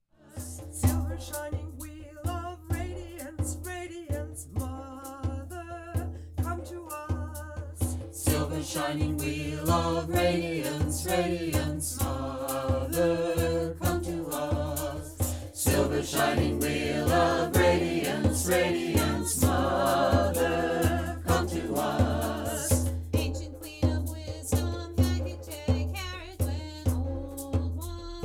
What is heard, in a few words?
Chants